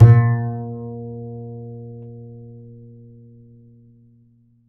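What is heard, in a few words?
double bass A#1, Jazzistic; Univers Sons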